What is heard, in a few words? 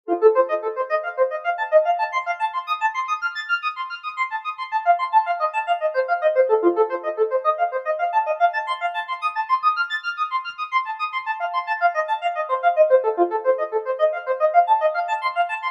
ringtone